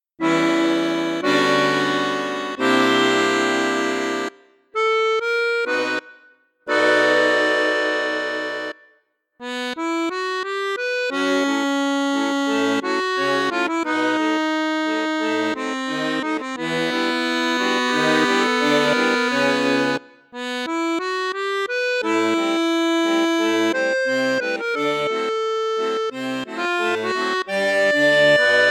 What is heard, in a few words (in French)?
registre basson, accordeon; piermaria 324